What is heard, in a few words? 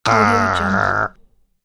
monster vocalization pain cartoons games